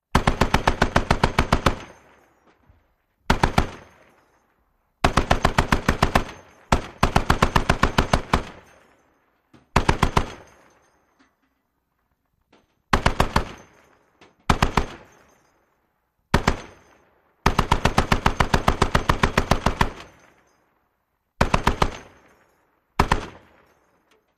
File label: .50 Caliber: Long Bursts With Shells Dropping